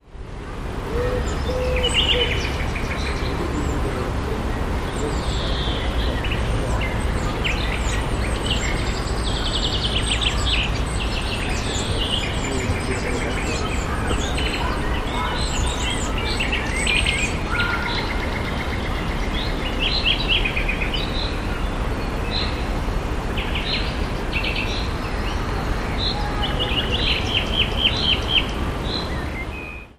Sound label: ambient